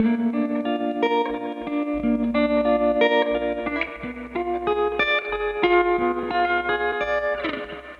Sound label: Trem Guitar, 70s Analog Funk; Sonic Foundry (Sony Creative Software); What it Is!